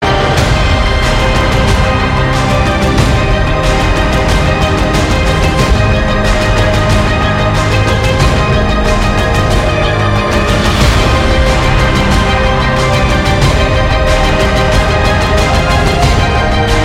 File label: beautiful effect